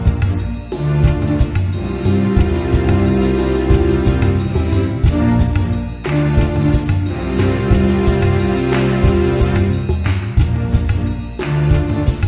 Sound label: authentic classica ringtone; Aidot